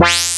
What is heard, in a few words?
HARMONIC, wavetables